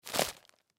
Package Plastic Wrapper Set Down Crinkle; Foley; Blastwave

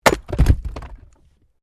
Wood Chopping Axe; Objects

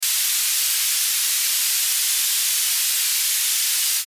static light; Static Light Frequency Sweep; Production Element Imaging Element Accent Transition; Blastwave; Static Sound Effects

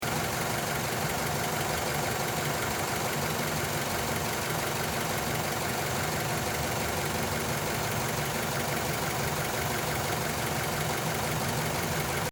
Car Mazda 3 Idle Loop, Engine Loop; Car 2009 Mazda 3 Idle Loop; Power Car sounds; Car Sound Effects

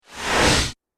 Laser Whoosh; Whoosh Sound Effects

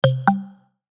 Chime Musical, Chime Musical Multimedia; Web Interactive; Chimes (Sound Effects:Multimedia)

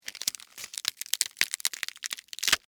Crunch Tear Slow, Cabbage, Head, Vegetable; Texture

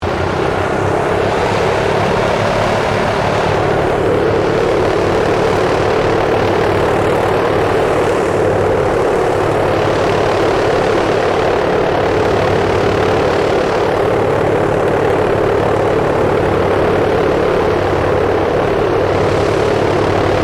AIRCRAFT LOCKHEED L-1049 SUPER CONSELLATION IDLE; Connie, Super Constellation; 1955; Airplanes; Vehicles

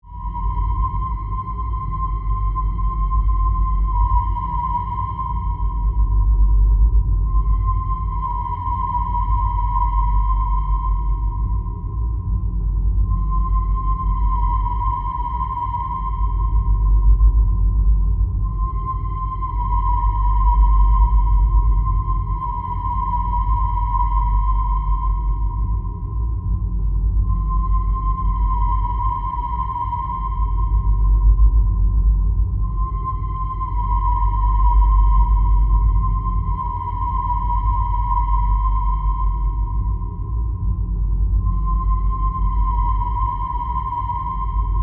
Drone Crystal Power, Supply, Scifi; Science, Fiction

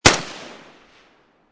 AK47 Barrel, Assault Rifle; Assault Rifles; Guns; VRSonic

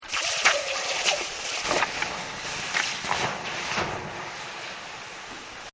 Hot Lava In Water; Nature Scenes; Environmental; Sound Effects:Nature

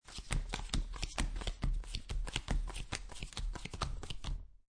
Playing Cards Dealing Cards On Felt; Card Sound Effects; Casinos; Lynne Music

